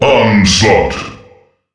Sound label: onslaught